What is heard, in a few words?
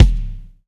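bass drum; AKAI S6000 CD-ROM - Volume 1; DRUM RYTHM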